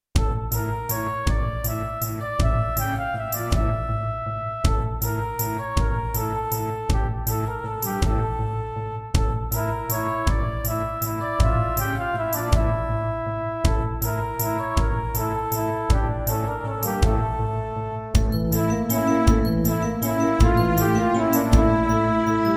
Roland JV-1010

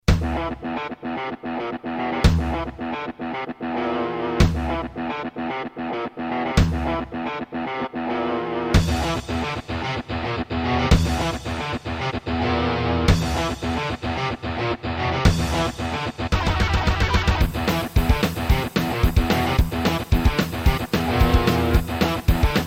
Roland SC-8820 88MAP; Doom

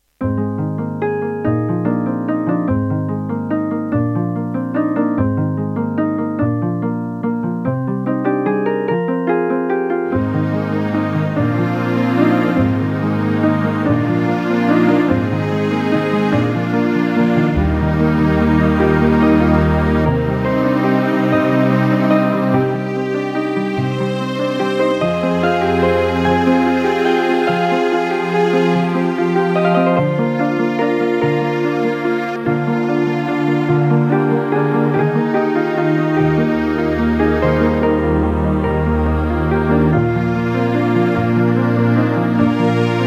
Irish, Terratec MiniWaveSystem SOWT-11; GM MIDI

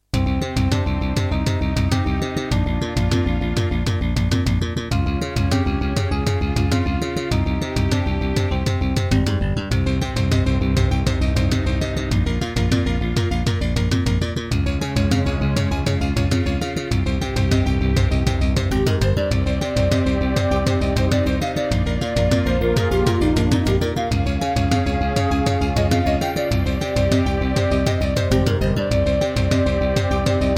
Serdaco Yucatan FX (Turtle Beach Cancun FX Clone); Introduction; Hocus Pocus

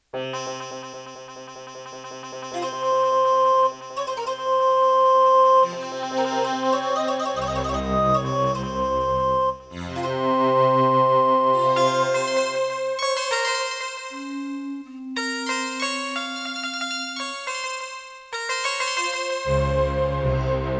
Serdaco Dreamblaster X16GS NATIVE; Prince of Persia 2 - Intro

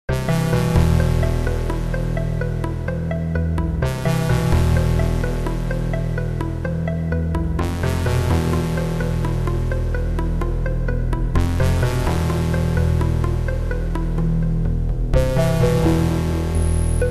Painter Room Serdaco MP32L CM32LROM

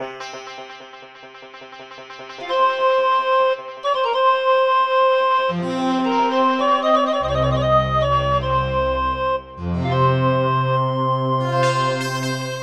Yamaha SHS-500; Prince of Persia 2 - Intro

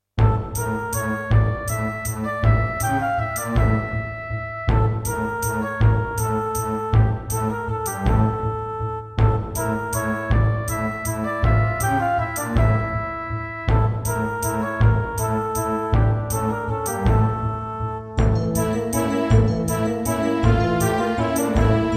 Roland SC-88

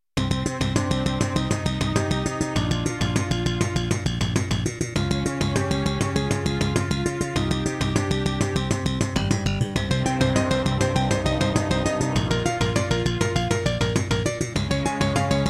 Roland SD-90 GS MODE; Introduction; Hocus Pocus

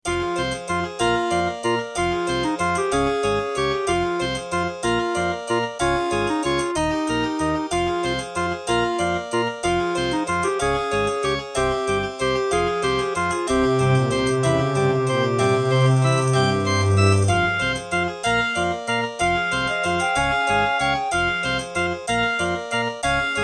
Hizon DB333; Death Gate

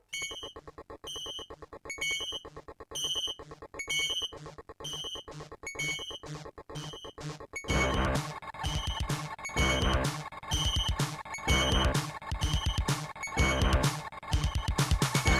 Terratec MinWaveSystem TTWT; System Shock

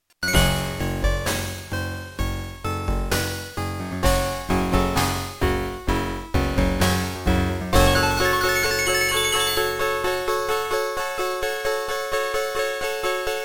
Introduction, Beepblaster 2.0 BEEPMODE; Doom (Sigil)

